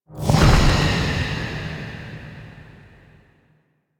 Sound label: Go Board Lose; Warframe; Sacrifice quest